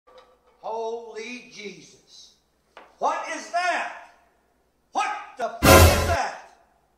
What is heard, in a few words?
big hit inbound